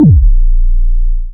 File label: dry perc, Quasimidi Rave-o-lution 309